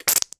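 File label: cartoon squelch; zapsplat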